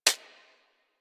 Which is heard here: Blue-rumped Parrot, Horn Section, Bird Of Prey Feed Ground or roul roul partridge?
Bird Of Prey Feed Ground